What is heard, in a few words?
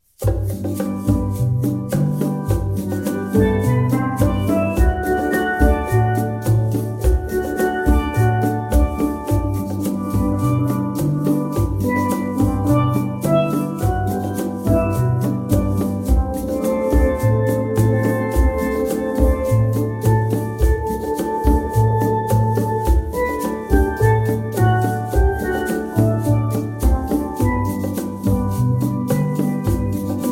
Quartet Latin, Steel Drum Band